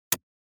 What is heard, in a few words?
UI moving option to one anoter